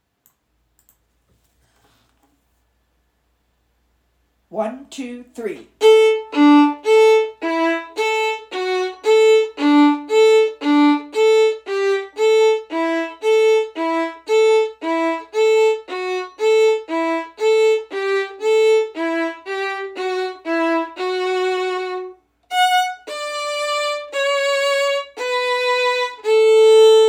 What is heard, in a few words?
Harmony; Dreidle